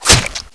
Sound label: knife hit; weapons